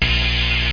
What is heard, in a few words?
ZAP; The Ultimate Guide to Mortal Kombat